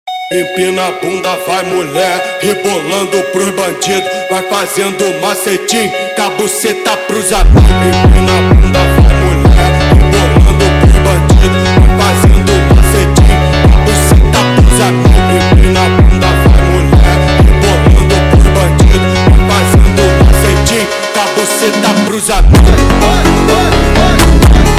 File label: Phonk Music; فانک phonk